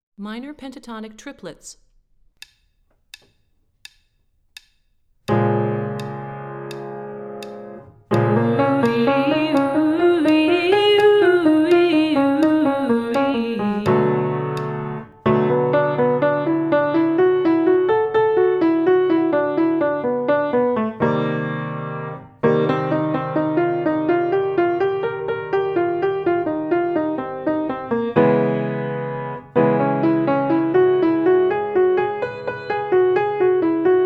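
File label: Minor Pentatonic Triplets - Low, Vocal Warm-ups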